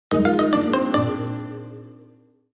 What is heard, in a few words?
Broken Weapon